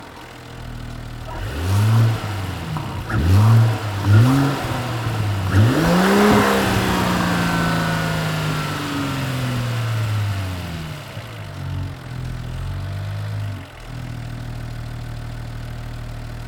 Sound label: Car Idling Mixture ENGINE, Mercedes E53 AMG; SoundBits